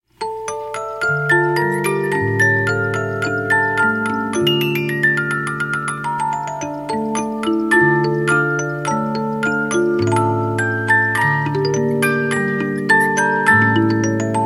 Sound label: 50-Note Movements, music box; Harbour Habu